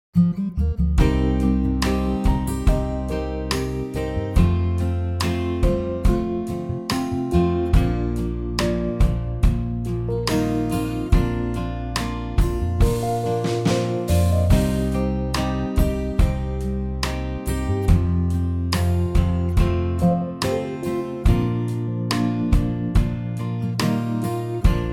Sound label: Bb acoustic